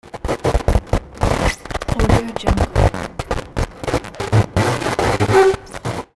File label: creaking distortion glitch